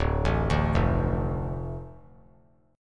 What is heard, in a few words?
D Minor D, chord; Dm arpeggiated; 2,4,5,3; JGuitar